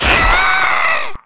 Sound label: death; Alien Arena; firebrand